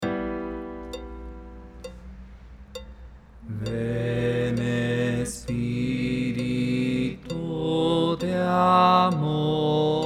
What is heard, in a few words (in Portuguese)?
soprano oitavado